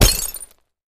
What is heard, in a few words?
Bullet Impacts Glass